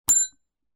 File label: BELL DESK